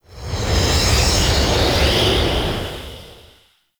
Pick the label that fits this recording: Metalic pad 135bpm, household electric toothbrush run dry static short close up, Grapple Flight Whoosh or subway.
Grapple Flight Whoosh